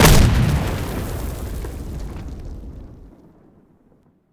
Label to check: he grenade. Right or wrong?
Right